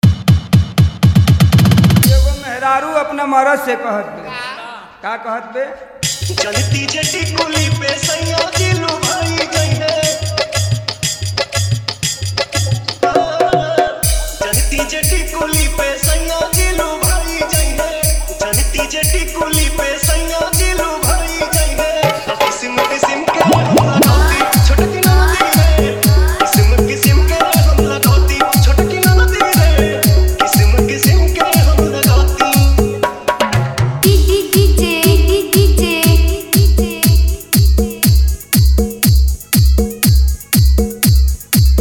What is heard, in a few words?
Bhojpuri Dj Remix Song Hard Bass Mix